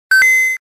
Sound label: power up sound effects